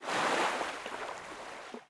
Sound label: Enter Water